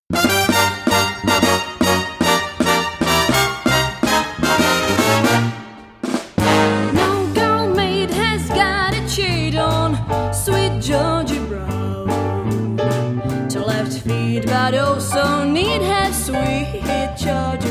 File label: swing band